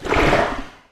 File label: paint blob